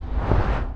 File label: bed